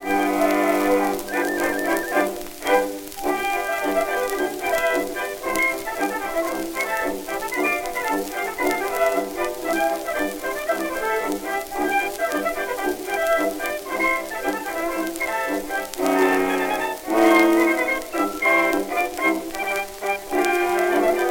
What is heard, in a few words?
Jolly jingles, jukebox blues